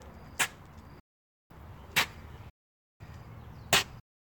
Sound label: Shovel Digging